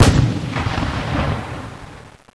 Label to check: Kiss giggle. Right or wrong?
Wrong